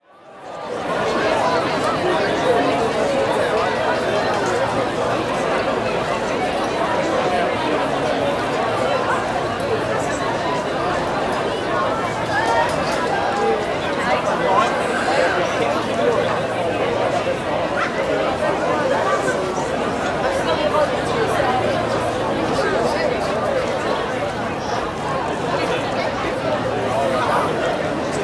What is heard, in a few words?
Crowd Outdoor Busy Marketplace, Walla; Sound Ideas; Walla Series